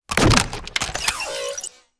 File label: future weapons mines charge; future weapons 2; soundmorph